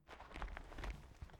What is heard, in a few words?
ring Tinker; Apotheorasis